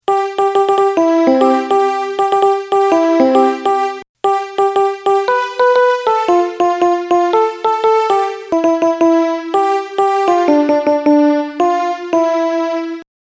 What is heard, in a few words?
melody